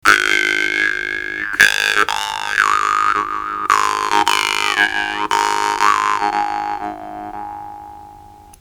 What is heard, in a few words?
doromb